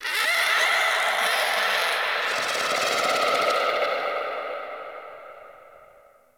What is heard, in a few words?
Venus Landscape Cave Creature, Ambience; Warframe; Venus Open World